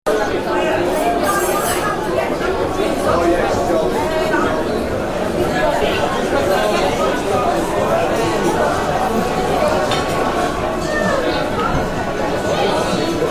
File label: Busy Italian Restaurant ambiance; Restaurants; Backgrounds